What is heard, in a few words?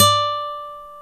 Guitar Nylon, Classical; Roland LCDP02 Guitar and Bass